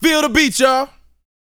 VOCAL F; ILIO

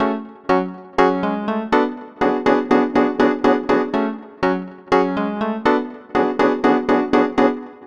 CCCP Keys; Sonic Foundry (Sony Creative Software); Club Joints and Street Anthems; Razor Edge

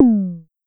Tom Lo Mid, Pollard Syndrum

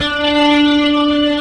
D, The Piano; InVision Interactive

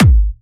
Trance Kicks, Bassdrums

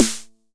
909 SD; Goldbaby Tape808 & Tape909; The Tape 909

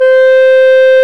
Clarinets; Roland L-CDX-03 Disk 1; Clar Cls

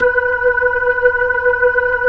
B-3; Roland LCDP10 Keys of the 60s and 70s 2; Club Ch. Xfde

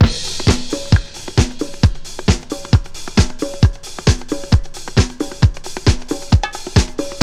house loop

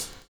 STONESNAR; Univers Sons

